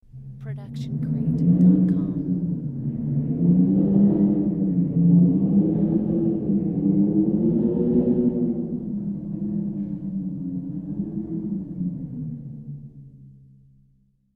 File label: timpani wind effect